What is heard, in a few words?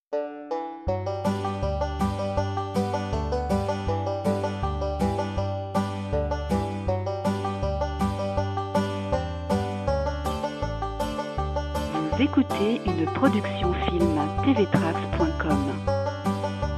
Rag Banjo